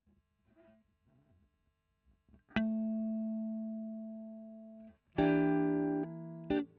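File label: electric guitar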